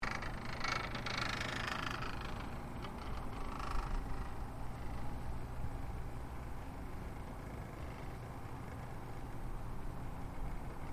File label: Chairlift chair journey, Chairlift operating; Skiing And Snowboarding Sound Effects; Skiing and Snowboarding (Sound Effects:Sports)